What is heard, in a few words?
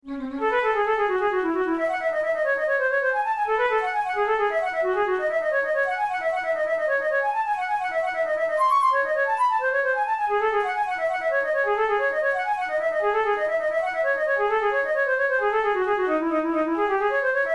caprice etudes, flute